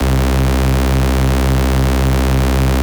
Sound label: RAVE, RAVESYN